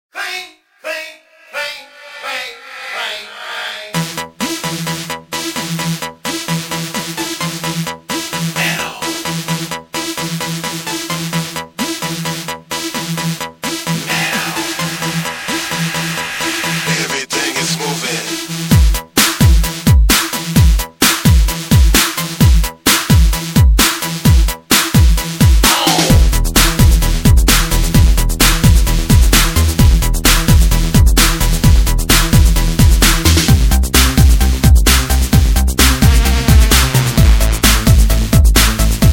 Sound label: Metal